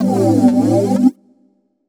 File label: Chords Tones; Equipped Music; Loungin House